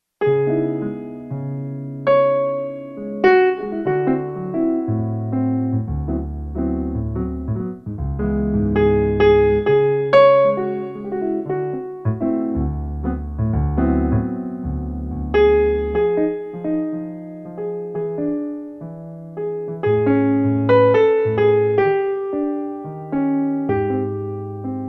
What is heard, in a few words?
waltz in Db minor